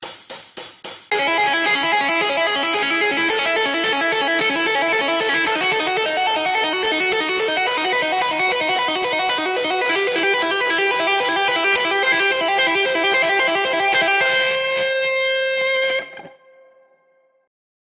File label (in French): tapping, guitare; muaReco